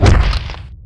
strider step; hl2 ep2

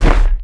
dog footstep walk; hl2 ep1; npc, dog

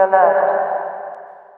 citadel alert; ambient; hl2 ep1; ambient, levels, citadel

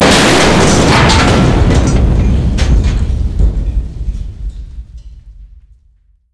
big gun destroy; hl2 ep2; ambient, levels, outland

